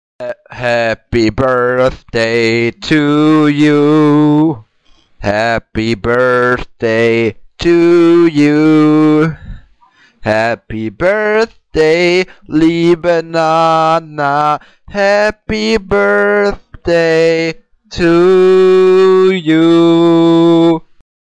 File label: Happy Birthday Nana